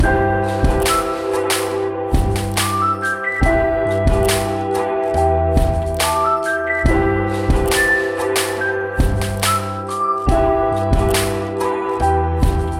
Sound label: guitar